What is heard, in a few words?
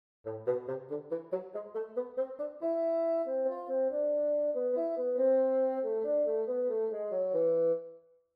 Bass Clef Example